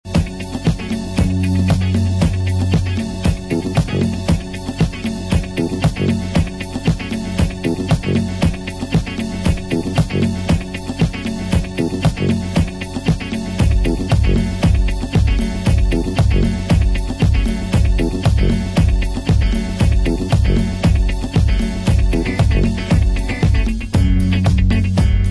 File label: instrumental mix